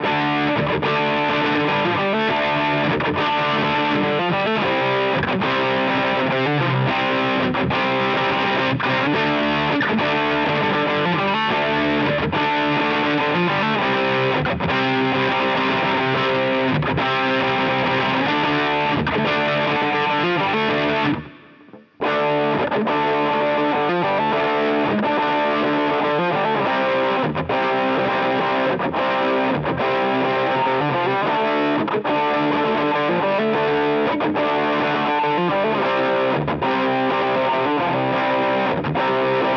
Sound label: Harley Benton DNAfx GiT Vox Midscoop on off